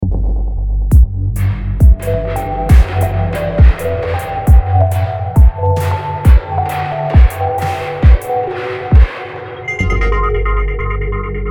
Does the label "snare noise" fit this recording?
Yes